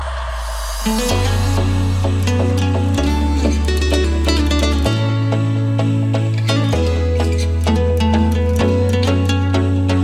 best quitar

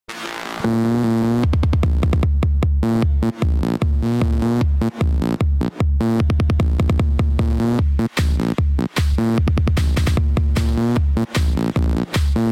Best Dj Remix

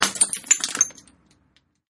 Bottle tone

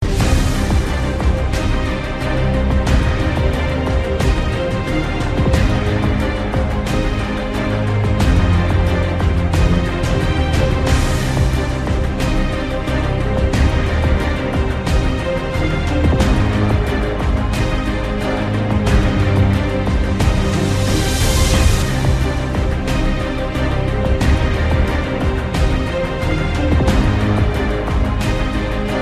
battle; transformers3

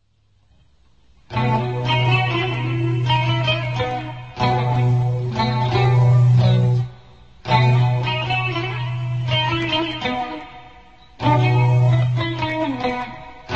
violin solo, guitar cover; lestat s violin solo

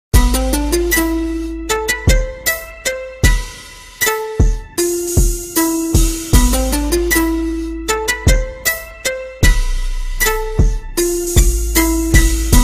black beat, hip hop

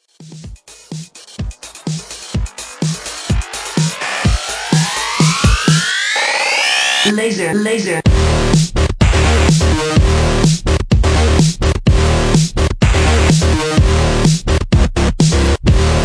laser laser

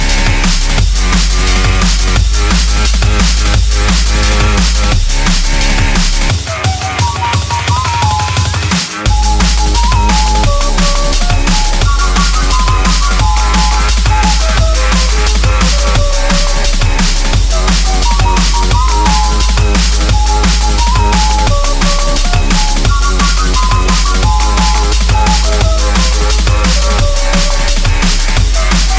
Flute Tune Remix

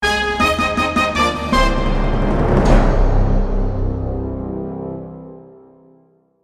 Marimba

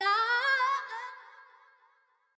Dembow Scream

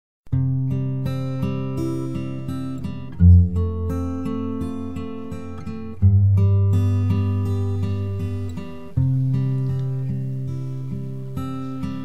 Folksy Ringtone

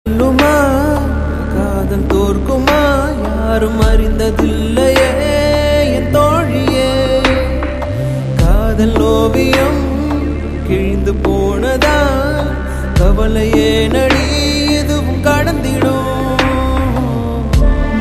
bgm

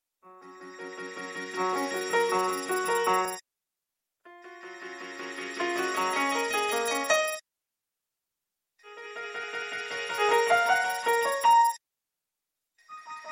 Reverse

